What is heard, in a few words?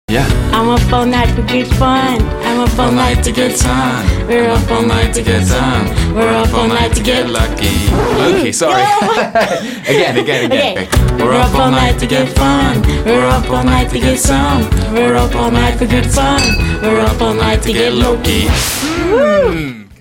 singing